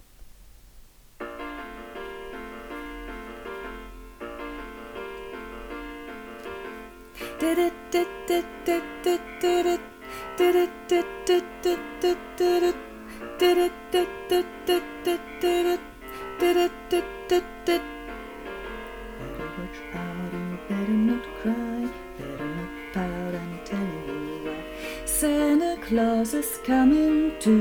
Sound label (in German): Sopran